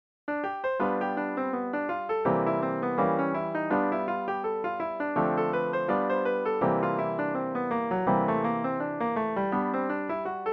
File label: G blues piano